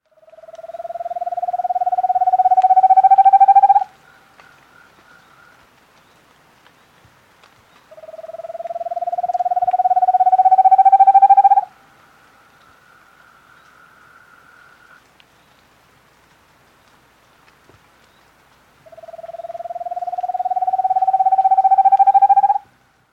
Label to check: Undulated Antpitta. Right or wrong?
Right